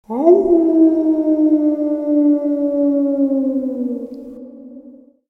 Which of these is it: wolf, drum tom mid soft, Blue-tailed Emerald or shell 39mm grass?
wolf